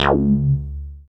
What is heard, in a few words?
SWEEP BASS; Masterbits; Dance Trax